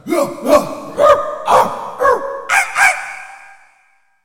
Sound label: Humanistic Barking